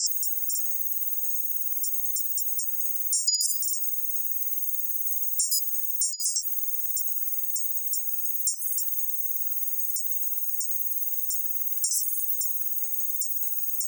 Data Transmissions, Modular UI; SoundMorph; Richard Devine

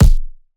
Punching Percussion, Straight Hand, processed

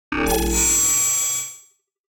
Process - Short; G4F SFX; ExoInterface

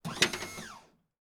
Electric Lift; 99Sounds; The Warehouse

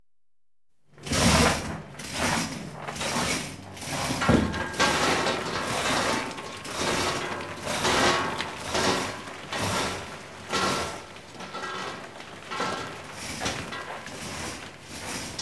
Paddle Steamer, Coaling Ship; Ships And Boats; BBC Sound Effects Library; Ships And Boats 1

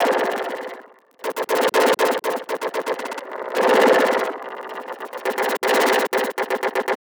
Glitch, Warble, Choppy, Swells, Bouncy, Deep; Airborne Sound; Manic Glitches

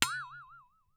Flexatone Boing Light; InspectorJ; 96 General Library

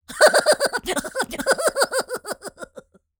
Cartoon Character Goofy Laugh; Apple Hill Studios; Apple Hill Studios Cartoon Sounds Volume 1

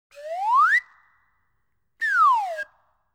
Swannee Whistle, Ascend And Desce; BBC Sound Effects Library

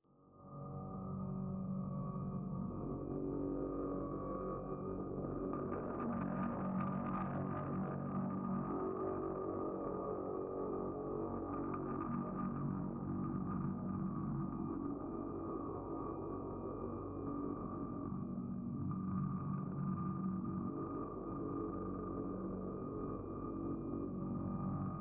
Magnetic Field; 99Sounds; Magnetic Fields

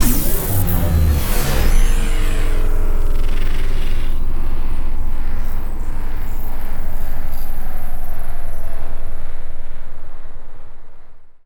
Autobots shutdown; Bluezone; Transformers

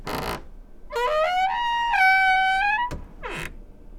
Low Creak, Door; FXHome; Squeaking

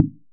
impact Wood medium; Kenney's Sound Pack; Impact Sounds

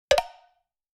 Heads Hit Together, Slapstick Comedy; Coll Anderson

